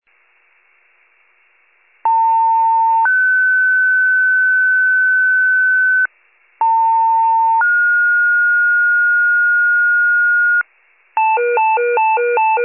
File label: fire, pager alert